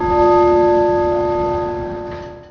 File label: Church bell; ambient; Project Gotham Racing